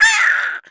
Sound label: Imp Die